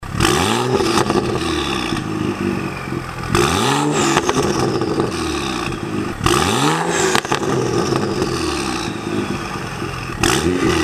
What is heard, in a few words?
Burbles Sound Example